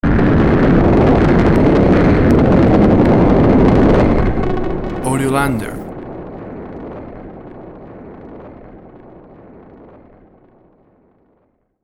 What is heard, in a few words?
The Sound Of Pain Low; great as a short stinger piece of audio